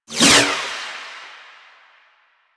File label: Finisher Parazon Whoosh C; Finishers; Enemies; Warframe; Kuva Lich